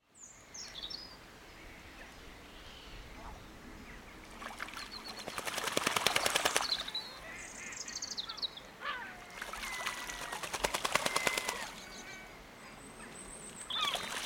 ducks wash in pond seagulls in bg

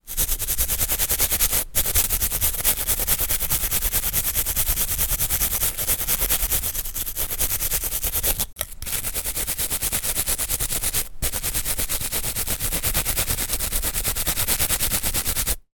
nail brush scrubbing fingernails

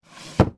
household dvd case slide into wooden rack

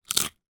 human bite potato chip crisp single

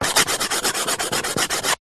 chalk stick thick scribble on small chalk board

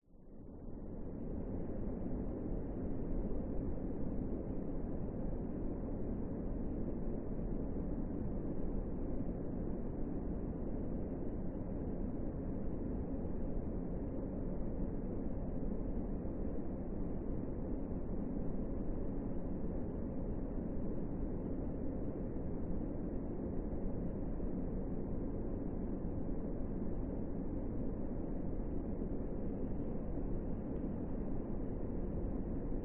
industrial warehouse abandoned subtle drone

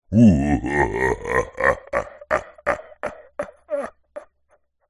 horror male low pitched evil laughter

